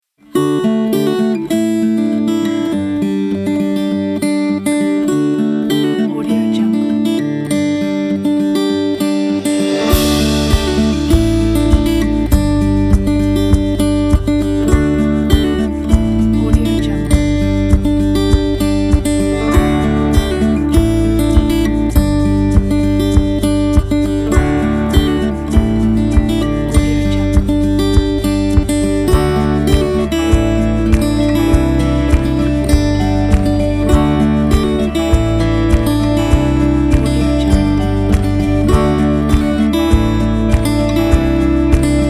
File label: acoustic folk travel